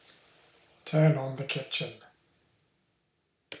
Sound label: turn on